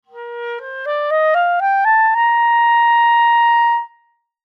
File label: Clarinet Scale Up, Clarinet Scale Up Hold End; Musical Clarinet Bb Major Scale Ascend Hold Last Note; Woodwinds; Blastwave